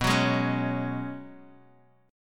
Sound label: Gb Suspended 2nd Suspended 4th B